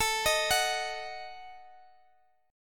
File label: Eb Diminished A, guitar